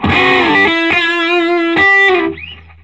SOLO E, Vintage Blues Guitar

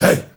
HEY HEY

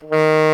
TENOR SAX D#3; Greytsounds VOL-1

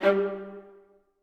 G2, Orchestra; AKAI Advance Orchestra Upgrade 97 Vol.1

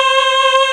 DIGI VOX

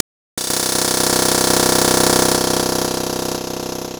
Glitchy, Beats

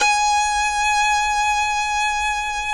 FIDDLE NOTES, G#5, R

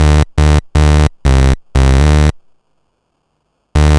Sh09 Bass Loop, 120BPM; musicradar; bitcrushed; Crushed Loops

